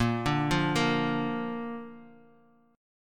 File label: A sharp Major; arpeggiated; JGuitar